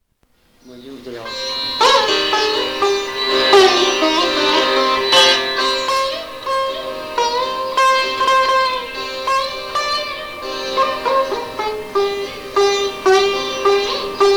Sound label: sitar